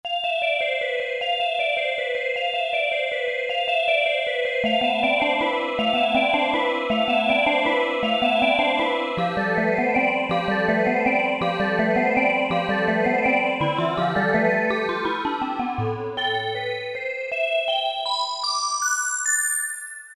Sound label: SEQ, Roland SRX-07; Roland SRX-07 Ultimate Keys